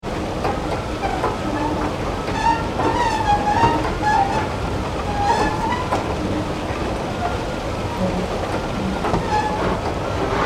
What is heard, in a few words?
Escalator Travel, Squeaking escalator; Escalators; Machines; Tom Hutchings